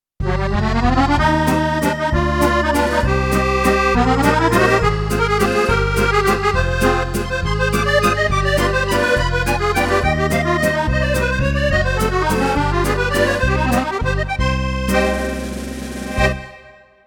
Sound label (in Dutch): Franse Musette